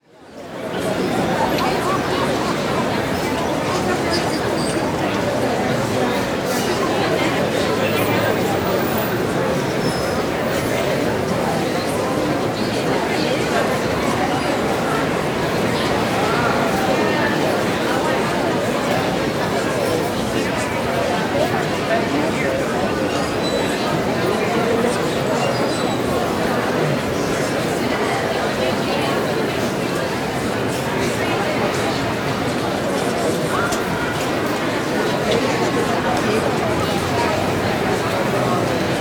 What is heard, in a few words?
Crowd Indoor Large Plaza; Walla; Sound Ideas; Walla Series